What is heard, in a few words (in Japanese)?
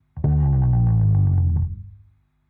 ベースのグリスダウン遅い